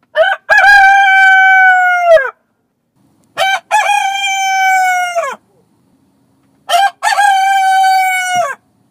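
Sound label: rooster crow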